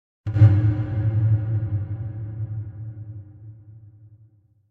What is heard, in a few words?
Impact Muted Dull Hit Fast Pulsating - Hit; Hollywood Edge; sound designer's toolkit v2